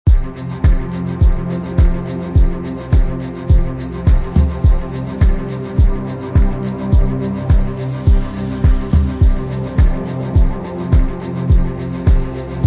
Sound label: orchestral chillout; Ambient music